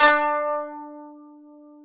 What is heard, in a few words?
KANUN D4; SoundBytes; Early Patches; KANUN SMAL